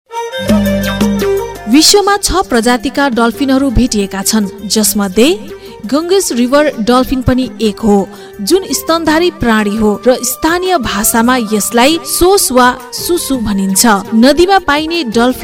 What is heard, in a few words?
River Dolphin Project Jingle